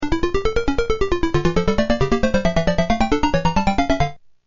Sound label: Organ; パイプオルガン演奏; PC-6001; コロニーオデッセイ